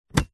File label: car 12 volt usb convertor remove unplug; vehicles; zapsplat